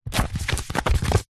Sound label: industrial sand paper sheet handling